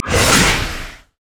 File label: blades attack; LoL; Nocturne; umbra blades attack